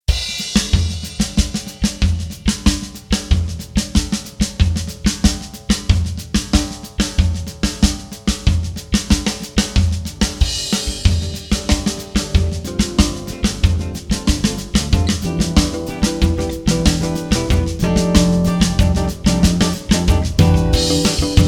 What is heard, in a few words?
brazilian